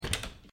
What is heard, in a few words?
Open Door